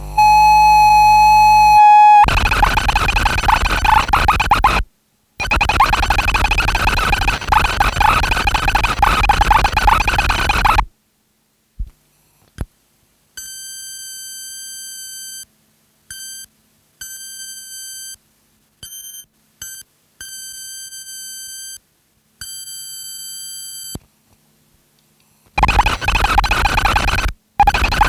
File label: geiger feedback